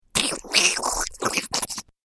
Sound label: Cartoon Gremlin Fast Eating; Cartoon Voice Sound Effects